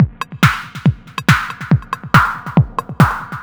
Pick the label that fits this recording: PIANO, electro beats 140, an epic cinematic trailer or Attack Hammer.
electro beats 140